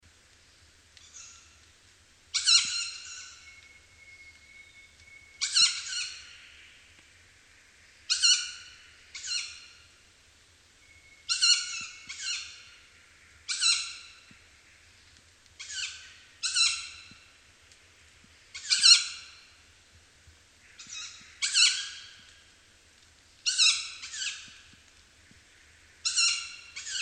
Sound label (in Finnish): lehtopollo juv; janakkala; laurinmaki